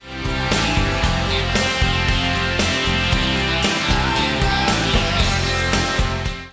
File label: snare